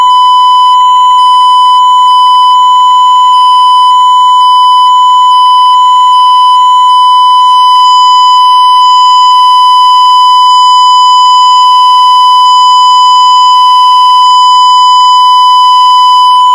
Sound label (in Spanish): distorsion intermitente